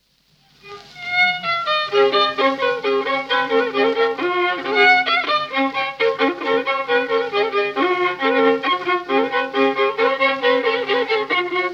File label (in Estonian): Naiste tants